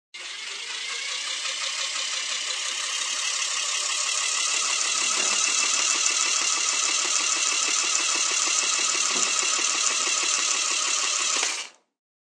Spnng Toy, Battery operated spinning toy.; Objects

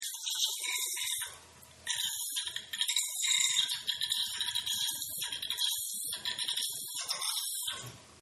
Bathtub Weird Drain; Bathroom; Household; Partners In Rhyme

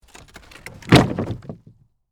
Impact Wood Plywood, Drop, On, Plywood; Materials; BlastWave

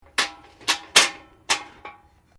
Bucket Metal Handle Movement; Common bucket; Materials

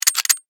Steampunk Switch; Steampunk Sound Effects; Steampunk (Sound Effects:Science Fiction)

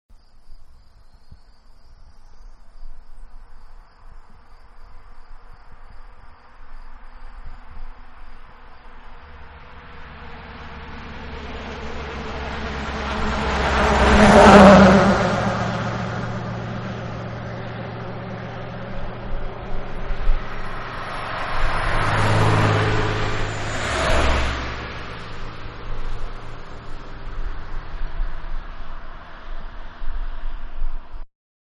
Several Cars Pass On Empty Road; Traffic And Car; Car Several-Passing On Empty Road; Manhattan Production Music

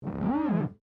GLASS SQUEAK FINGER

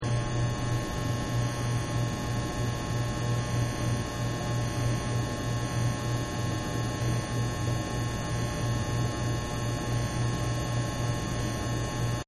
Electric Buzz Loop, Electric Buzzing Hum Loop; Tech; Tech Sound Effects

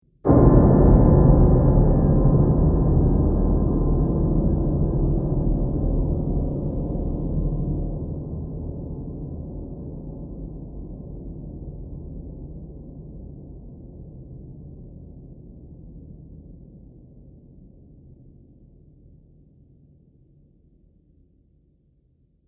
Horror Dark Piano, Piano Sound Effects; musical

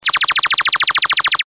Sci Fi Laser Gun Beam Blaster Burst; Blastwave

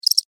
Insect Cricket Chirp Single; Insect Voice; Animals

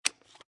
Pistol Semi Auto Clip Removed Full; Gun Handling Sound Effects

